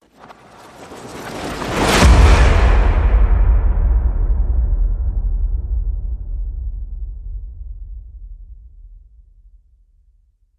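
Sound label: hit horror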